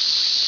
Fuse; worms2

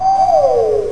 win

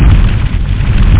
burning

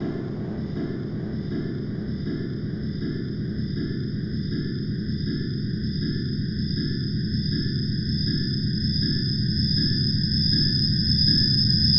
AMBNT 160; Ambient

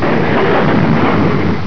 player die; 300 games for mac; Arcade; F.A.R.M. Patrol

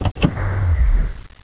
Plunger

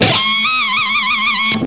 GUIT WAIL; guitarras

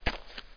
step dirt; specops

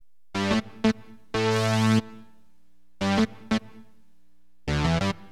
JUNO, Keyboards

